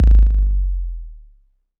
808 BD long, Roland-808 Drum Hits Bass Drum

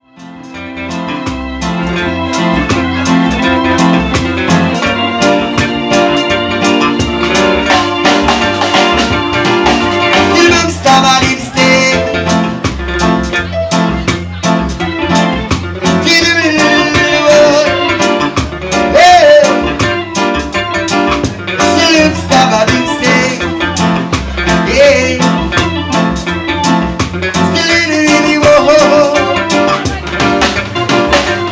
Fixer Reggae

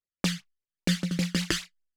808 Loop SD 95, Roland 808 Drum Loops, 95bpm

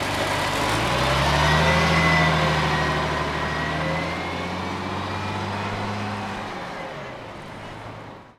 truck Pulling Away; vehicles

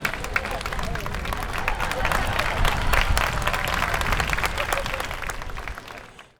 applause

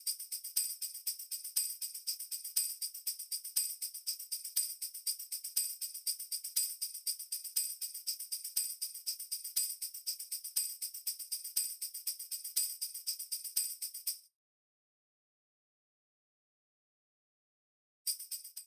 Tambourine

